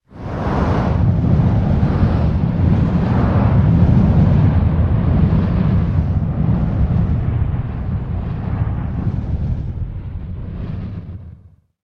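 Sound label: sounds of meteorite asteroid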